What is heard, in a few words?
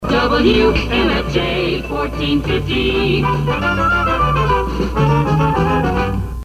jingle; 1450 WMFJ